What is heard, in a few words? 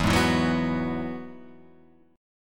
E Major Add 9th